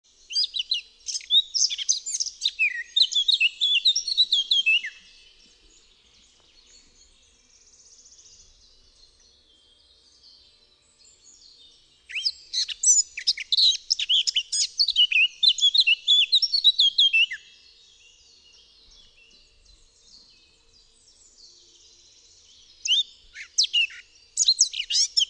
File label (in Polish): KAPTURKA, birdsounds